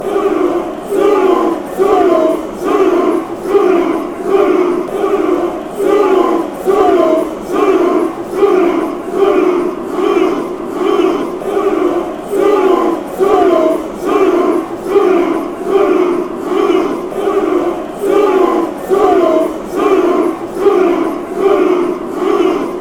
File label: Club Brugge chant